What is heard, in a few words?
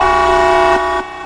nuclear alarm; pyledriver